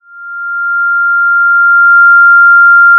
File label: Sound of a police car siren